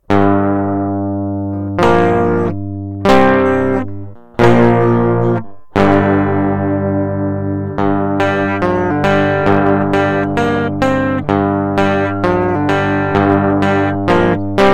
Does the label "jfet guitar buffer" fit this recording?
Yes